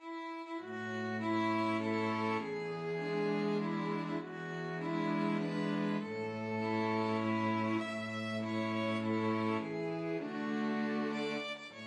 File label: happy birthday, string quartet